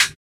dampened hat sound sample g key, Royality free pedal hi-hat one shot tuned to the G note; hat samples